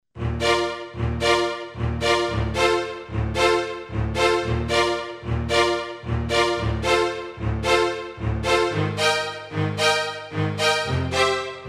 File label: Strings